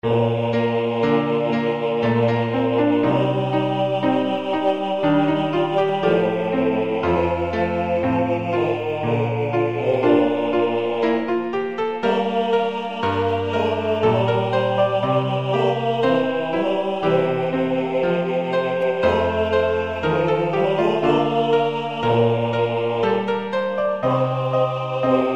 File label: Sanctus bas; Mozart; Missa Brevis